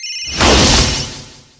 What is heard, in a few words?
phone throw; florent